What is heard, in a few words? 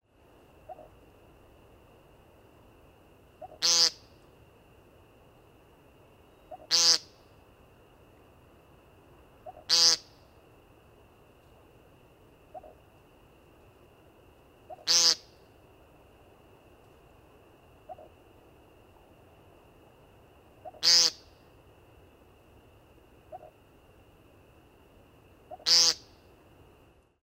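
Woodcock American Peent Tuko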